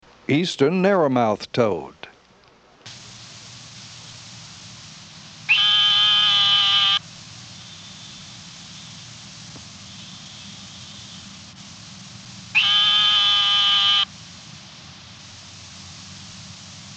Eastern narrow-mouthed toad (Gastrophryne carolinensis); Family Microhylidae - Narrow-mouthed Toads